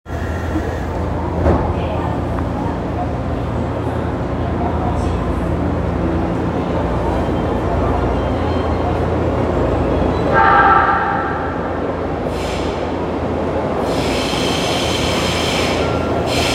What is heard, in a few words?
train sounds; 日比谷線恵比寿南